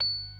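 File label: PERC F sharp 5; Best Service; Advanced Media Trax Modern Composer; B3 FULL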